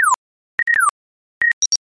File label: Wrong Password Beeps